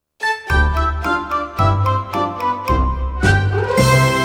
Scary Fairy Exit

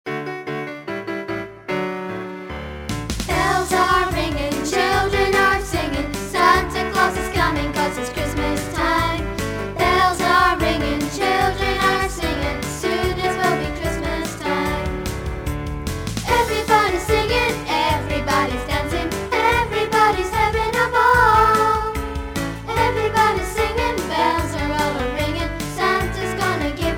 Children Are Singing

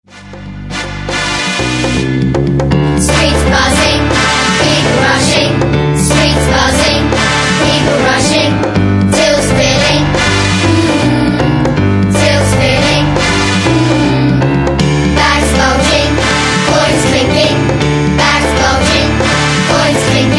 Streets Are Buzzing

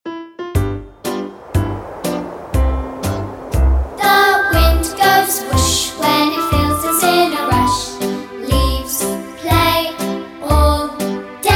The Wind Goes Whoosh

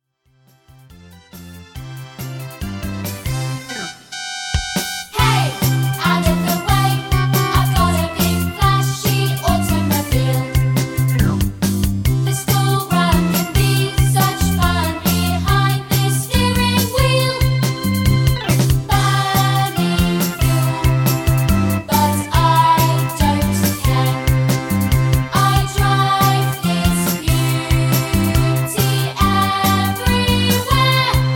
Driving Song

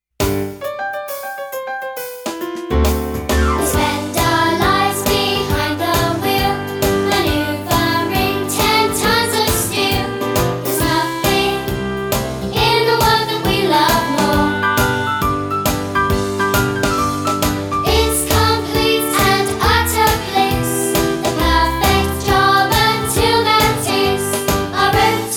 Blues

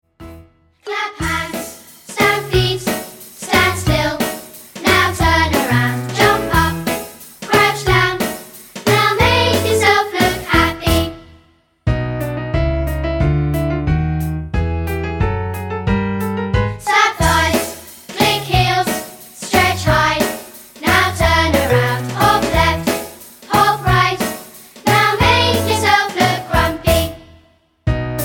Clap Hands Stamp Feet

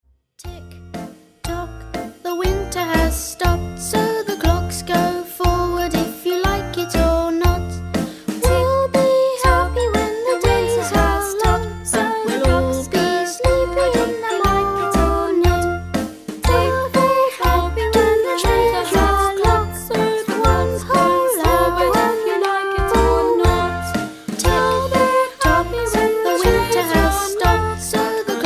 Tick Tock

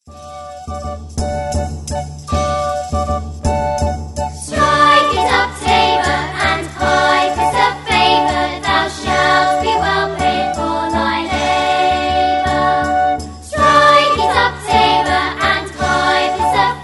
Strike It Up Tabor (vocal)

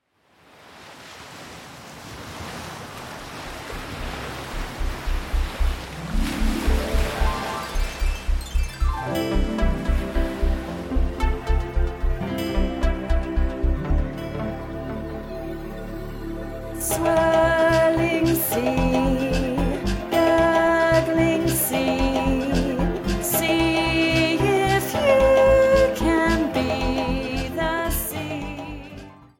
Swirling Sea

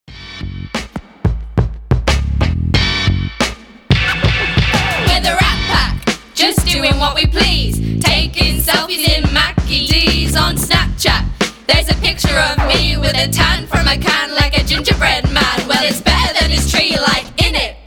Rap Pack

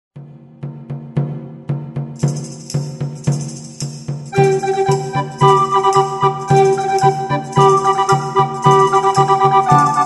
Fanfare

